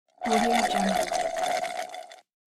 drinking with straw

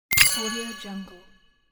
key unlock

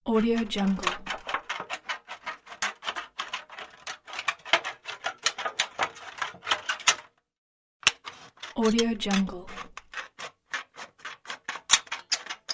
scissors

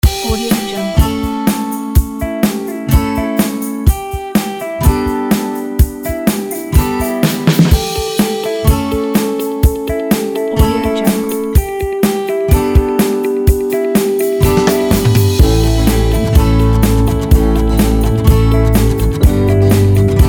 happy uplifting corporate

